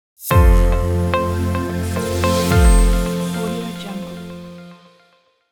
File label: logo technology light